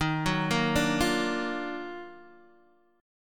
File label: D sharp Minor Major 9th, Guitar, DADGAD